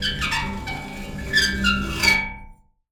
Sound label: Pane Of Glass Rub